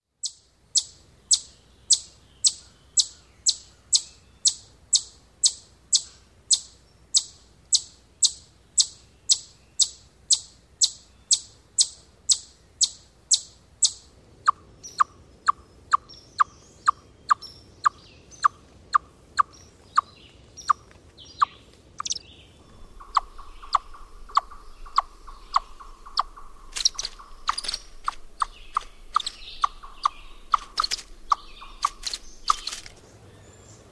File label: eastern chipmunk; lang elliott